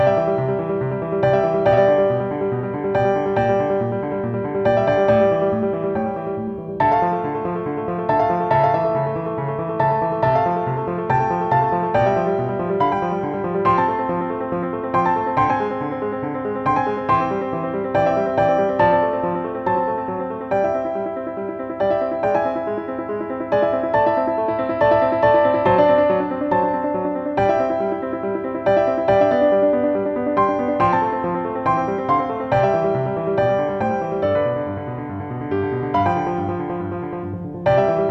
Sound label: Alberti Bass Exercise; Technical Exercises for Improving Keyboard Technique